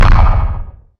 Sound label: Small metal hit explosion; Hovl Studio; SFX Battle sounds; WhiteMan Unity2D